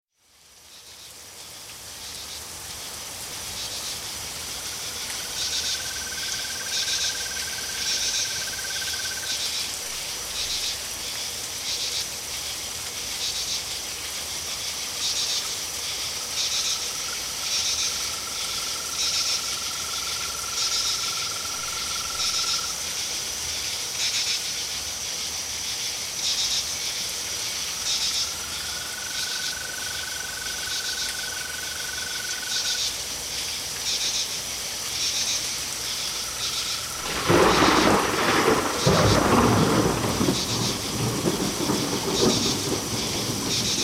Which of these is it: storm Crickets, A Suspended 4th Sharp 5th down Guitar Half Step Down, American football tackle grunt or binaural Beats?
storm Crickets